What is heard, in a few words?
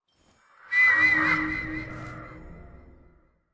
shadow summon; karasu